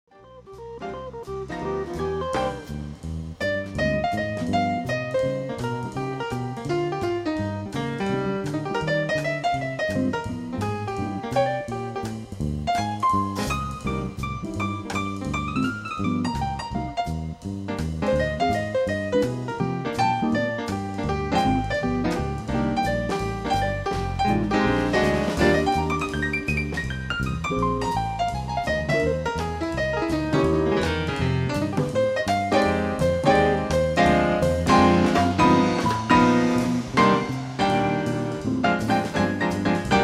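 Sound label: PNO, piano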